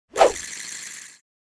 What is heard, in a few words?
Fishing rod cast